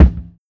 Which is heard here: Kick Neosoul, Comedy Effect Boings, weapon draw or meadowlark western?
Kick Neosoul